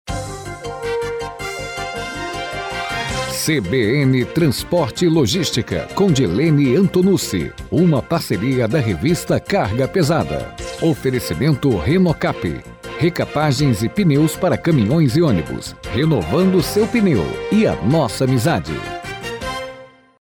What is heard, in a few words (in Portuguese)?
vinheta; CBN Transporte e Logística